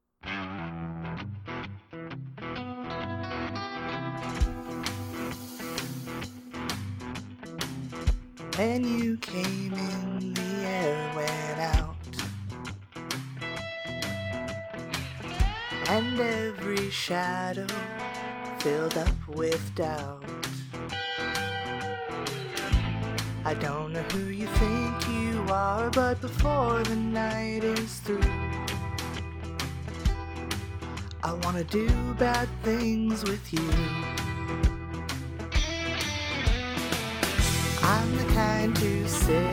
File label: [Backing]; True Blood; TV Songs